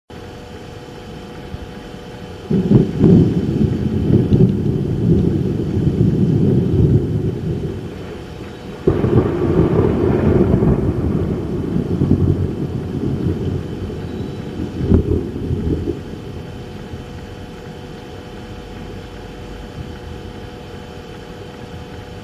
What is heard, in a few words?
Thunder